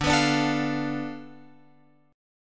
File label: D Minor 6th Guitar Open F; Dm6 strummed; JGuitar